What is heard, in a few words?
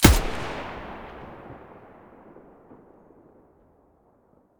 mdr muzzle mono, weapons